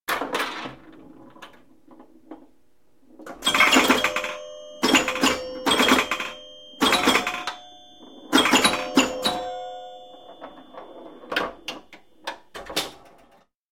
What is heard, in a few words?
pinball machine being played